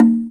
GATO, Percussion; InVision Interactive; Percussion Slam

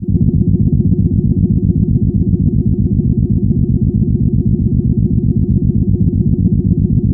SUB WAVE, Special FX Twisted; USB Soundscan vol.14; AKAI

